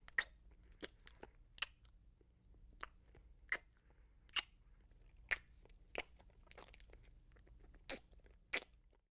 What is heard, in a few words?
Heavy Kissing, Heavy Kissing With Wet Lip Smacks. - Wet Kisses